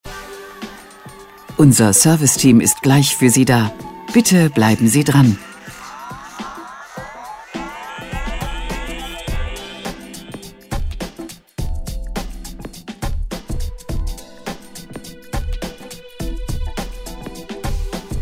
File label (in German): Warteschleife; Telefonansage Versicherung